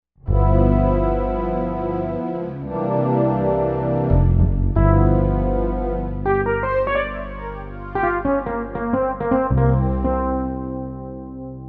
Bass Suite

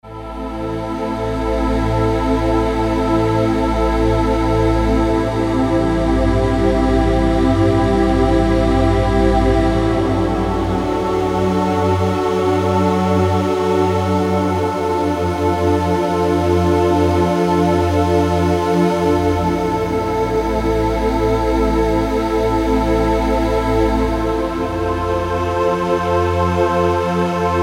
Slow Choir

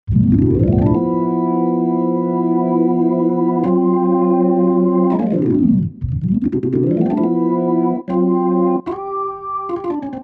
Hammond Organ; synthesizer; Guess the synthesizer game

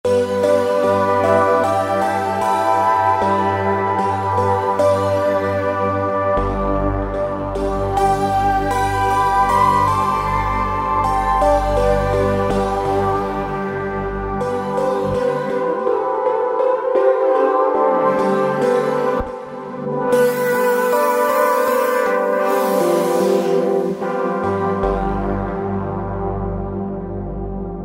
WinterBreath, Roland V-Synth

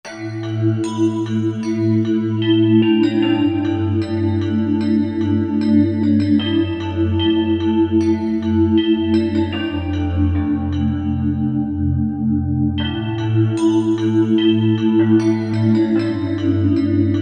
velo chime

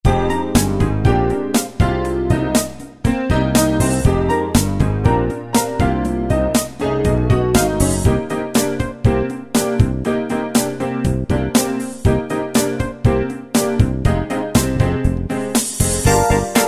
A.O.R. Pop; Preset Styles

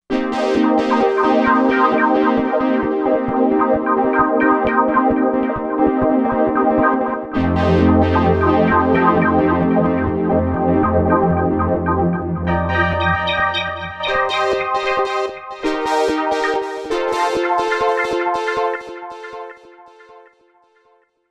Busy Brass

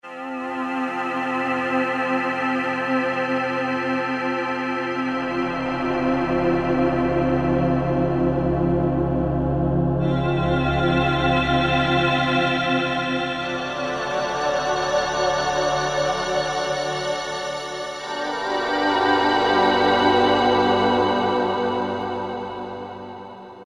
Ahh Tube, Alesis Z5; Vintage Synthesizers And Classic Beatboxes

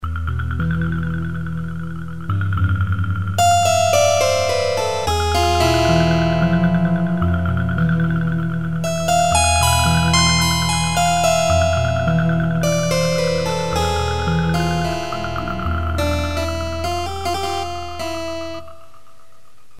Keyboard

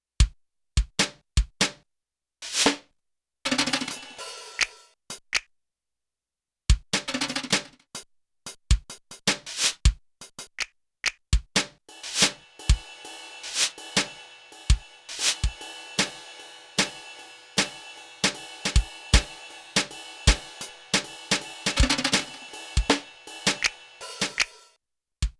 Jazz-Drums C2-B2; Yamaha TX16W; Jazz Performance

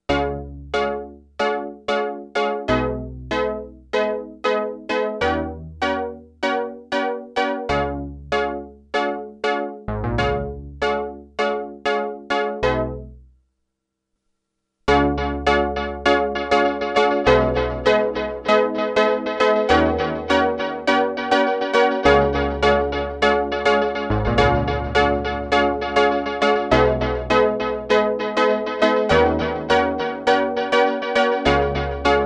Echo

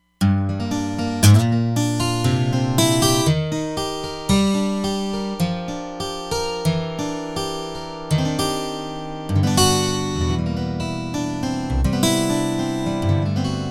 R&B acoustic, Roland Fantom XR

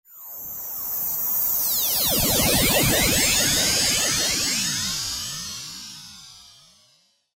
Ringmod Cntrl, Roland V-Synth; Factory Patches

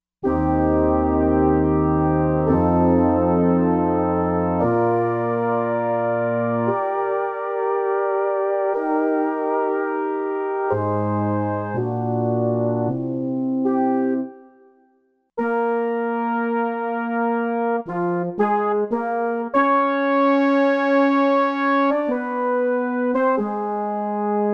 Smooth Brass

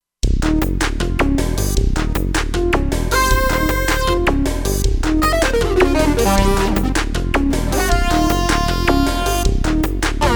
C2 and Lead

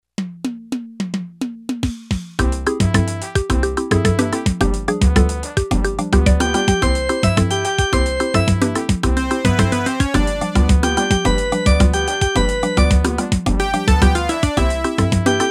LA CUCARACHA, Yamaha PSR-300, Factory Demo Songs

